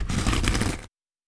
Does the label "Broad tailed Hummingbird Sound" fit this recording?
No